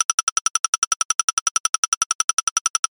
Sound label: ticking